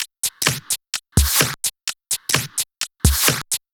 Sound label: perc 128, Electro percussion loops; Anthemic